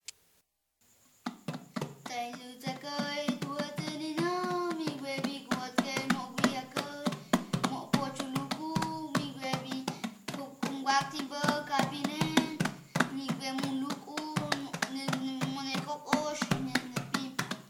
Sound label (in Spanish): lacandones canto del pescado